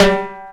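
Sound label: timbale hit; Sounds Good - Roots Reggae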